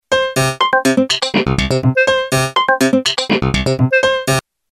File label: PCM Loop; Waveform demos